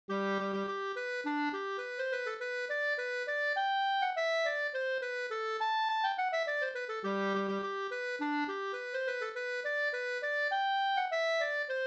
slangpolska; Ola Lans polska; slängpolska efter Ola Nilsson Lans, Sjörup, Skåne, ur Svenska låtar, Skåne, nr 1005

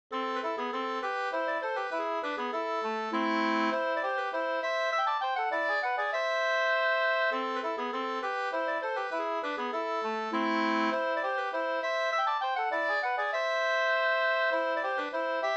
polska Bingsjopolska; e Myr Anders Persson 2st

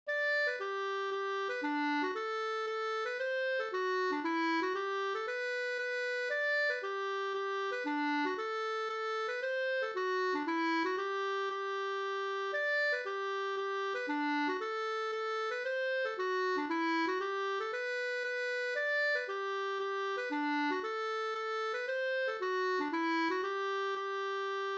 mazurka; mazurkalin e Ernst Abrahamsson Bh SvFolkdD2 p16

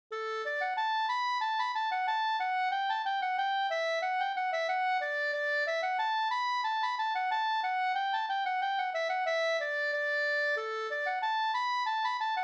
polka, polkka; Salonkylän polkka, polka från Finland

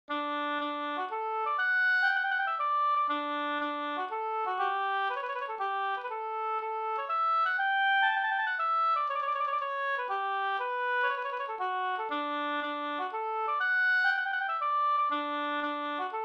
polska Bodapolska D dur polskan

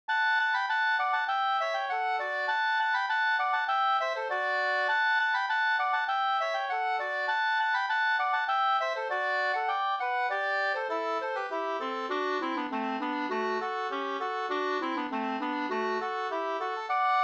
halling; e Pehr Myhr Hj EO708 2st

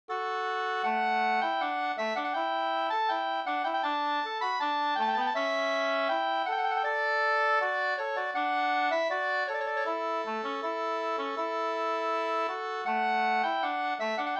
brudmarsch; fr Voss

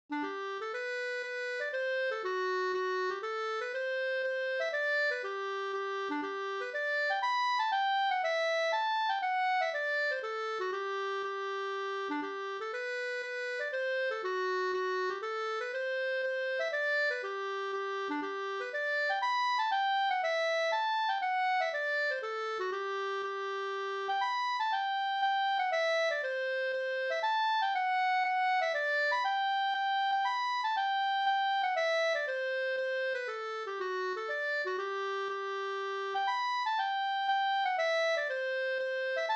polska Bodapolska polska

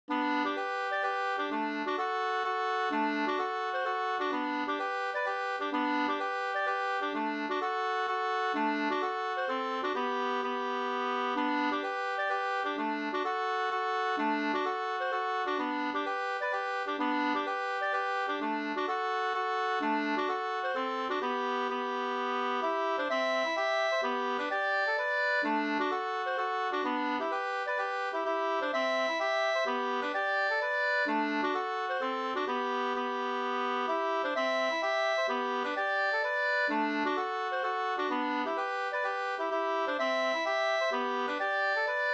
hambopolkett; Gubbstot e Finnmark Hellstrom Og V1 2st

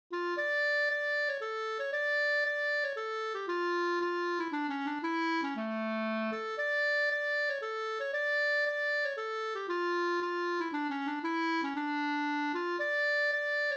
polska senpolska

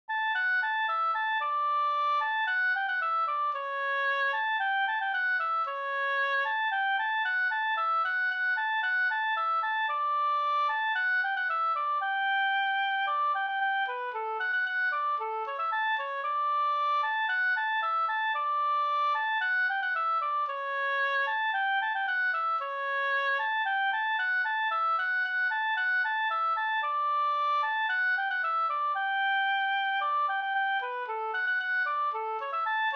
polka polkett; e Olof Jonsson Havsnas Jm

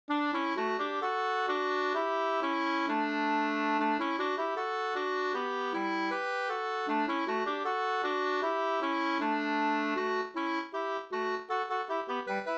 folkdans, 2st; Flamländsk sevensprong, danslek från Belgien

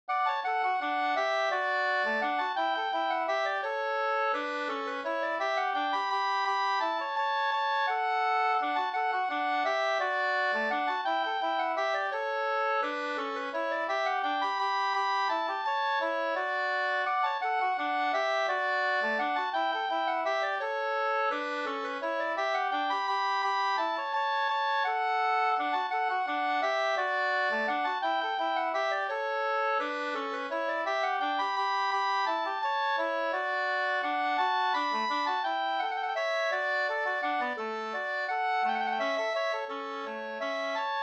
vals; Lars Pang

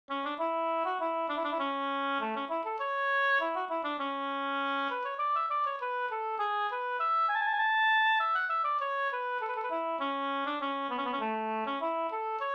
ganglat A-dursganglat; Elg Anders; Rattvik

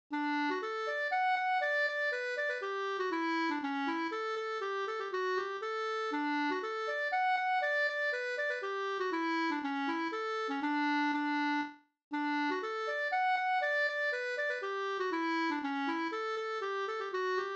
polska senpolska; Olof Abrahamsson An

